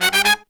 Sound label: Pop & Funk Brass